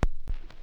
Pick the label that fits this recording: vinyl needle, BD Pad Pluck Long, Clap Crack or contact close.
vinyl needle